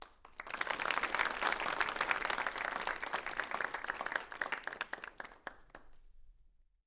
Crowd Short Polit, small int

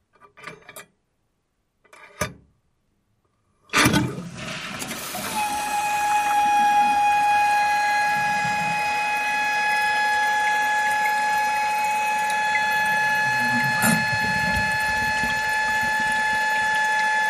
Ambiance Water Alar; toilet

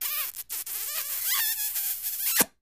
Kisses: Sloppy And Funny, From Close Perspective.

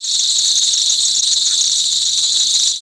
Cicada Song Ambience

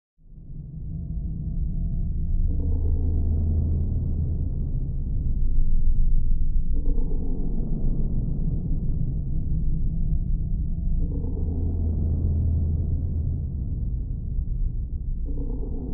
Space Ship Alien Mot; spaceship fx

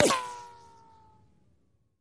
Bullet Ricochet Sin

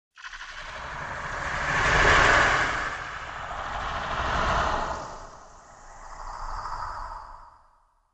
Demon Growl Hover, scary voices

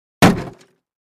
Plastic Car Door Hit

